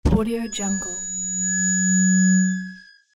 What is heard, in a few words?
drop a microphone